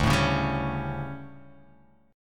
D Major 7th Suspended 4th, chord; DM7sus4; strummed; JGuitar

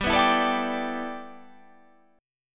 G 6th Add 9th

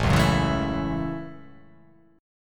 A 6th, guitar

